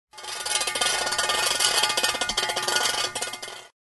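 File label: shovel sounds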